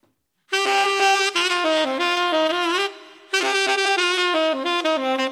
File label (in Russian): muzikalnaya igra s intresnimi elementami